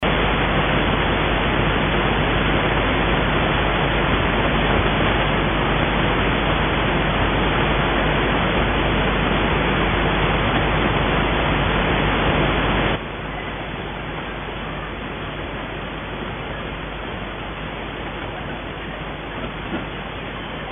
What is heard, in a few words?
dsp filter dx-21 germanium receptor; sprachextraktor